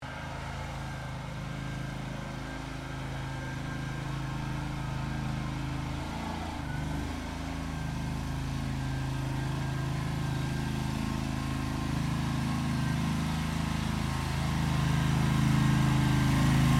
Cleaner Honda Gxv530 Polyvac Approach Withdraw, Gas powered floor cleaner; Cleaning; Household